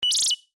Beep Tone Readout, Scifi, Beep, Tone, Readout, Chime, High, Pitch, Fast, Science, Fiction; Tech